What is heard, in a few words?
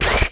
hit bloody bat